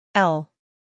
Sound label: owl